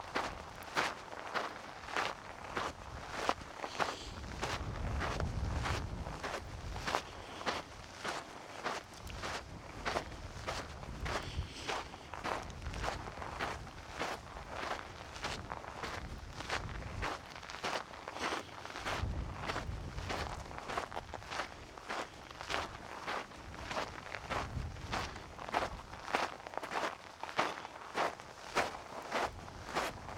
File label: trail; jtnp